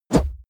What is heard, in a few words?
swoosh close; swooshes-swishes